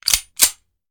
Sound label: Hand Gun Foley; Sound Ideas; Guns